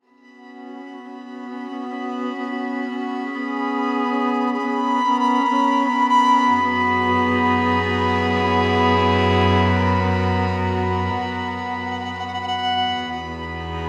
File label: Violin; Tom Bellingham; intimate violin